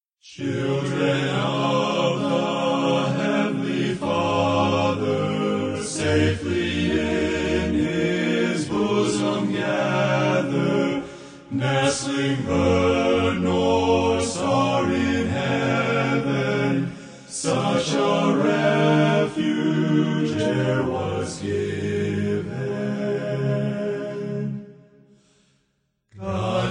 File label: choir; epiphany music